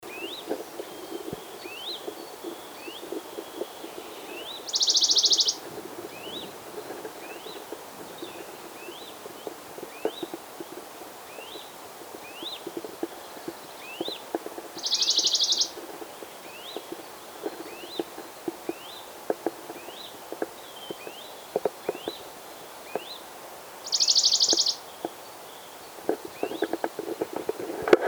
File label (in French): pouillot bonelli, une plaine une foret